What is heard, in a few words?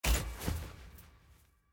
artifact click; vi